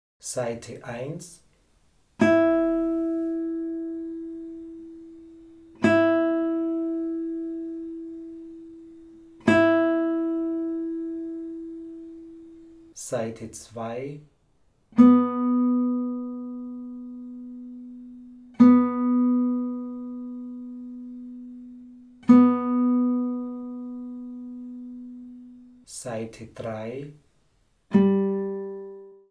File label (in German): stimmung der gitarre